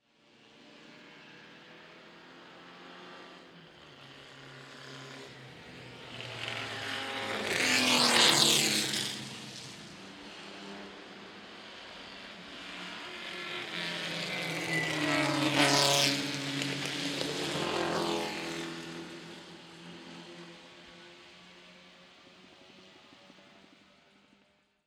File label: 60s racers several, Race Car, Several Cars Drive By, 1960S Era Road Racers; Several 1960 Sports Race Cars By , 6 Cylinder; Vehicles